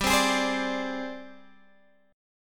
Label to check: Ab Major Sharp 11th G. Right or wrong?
Right